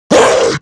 infec pain

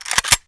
bolt pull, AK-101; stalker

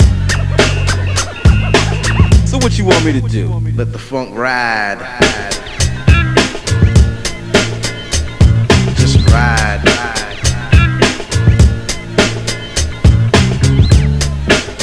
funk ride

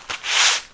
med shot no

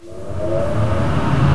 tur hum, ambience; caged

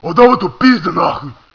zombie alert; zombie soldier

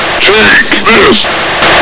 throw

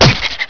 tube critter hit

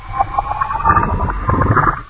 spin up; floater

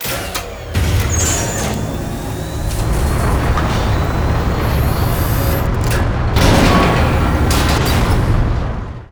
door

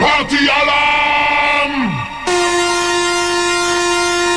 party alarm

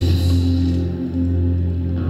Alien amb; Resurgence

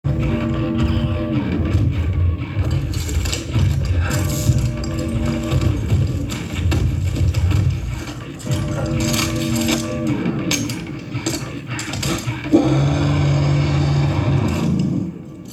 Ambs; Resurgence

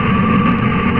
door loop

